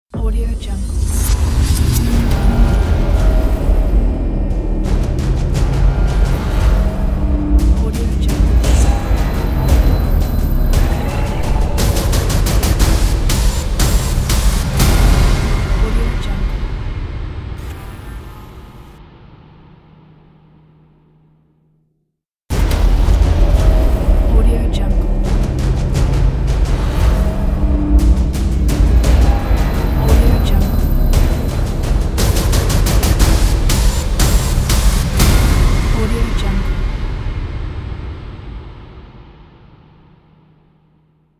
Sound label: epic game logo